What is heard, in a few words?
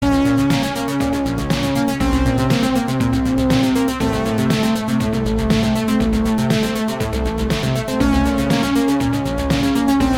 Boss Music